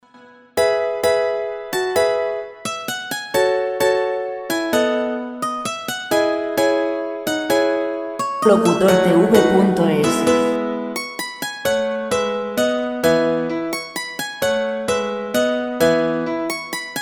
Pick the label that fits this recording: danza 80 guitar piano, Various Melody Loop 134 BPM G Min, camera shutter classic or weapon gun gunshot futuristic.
danza 80 guitar piano